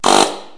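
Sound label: AIR CHISEL, CONSTRUCTION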